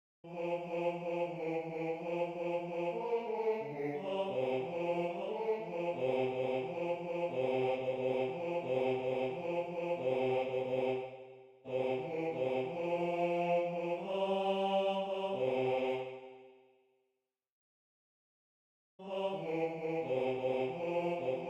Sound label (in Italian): Basso; I cieli immensi